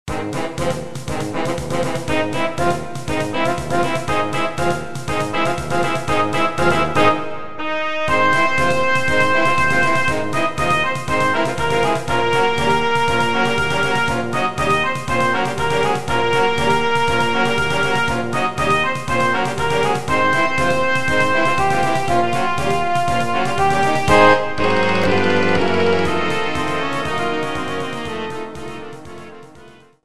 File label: Samba Brasil